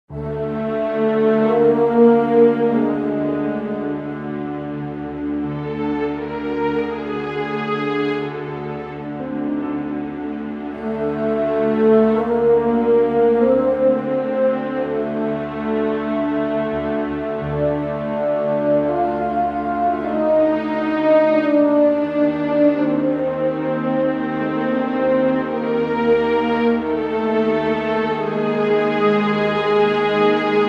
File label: Hanged Player Music